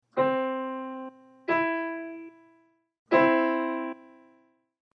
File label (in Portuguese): terca maior